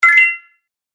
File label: Magic Tokens Game Fx; Video Game Sound Effects